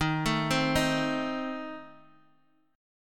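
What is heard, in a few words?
A Diminished E flat, chord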